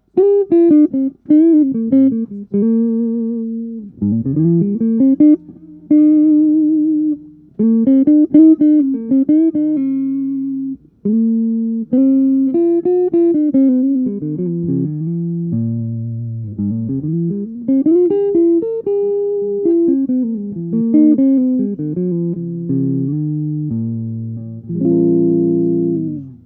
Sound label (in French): clair les paul; egnater